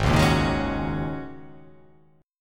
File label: Ab 7th Suspended 2nd Suspended 4th Ab down; Ab7sus2sus4 strummed